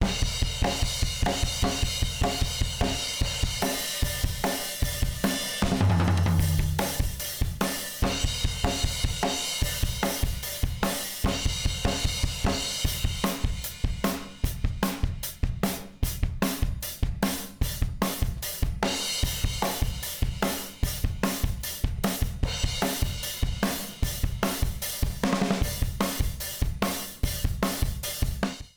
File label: Punk Drum; MusicDelta; MDBDrumsPlusPlus